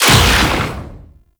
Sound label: Artillery Barrage Shot; Archwing